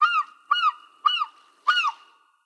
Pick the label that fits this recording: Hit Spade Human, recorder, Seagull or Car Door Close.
Seagull